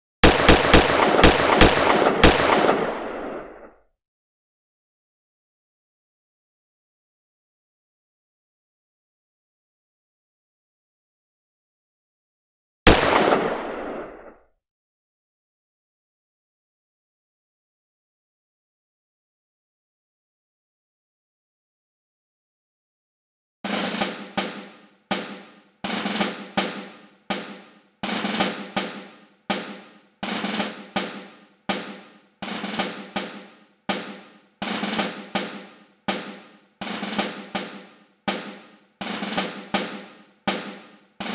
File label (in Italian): musica